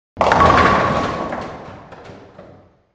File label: Bowling Ball Hits Al, bowling